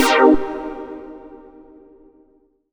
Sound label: Lead C3; Zero-G Club Techno